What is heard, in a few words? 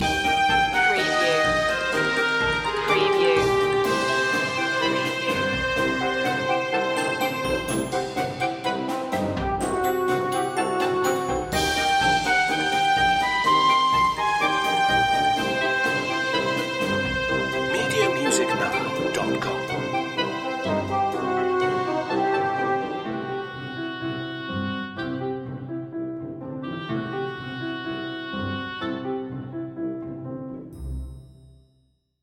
a quirky flourish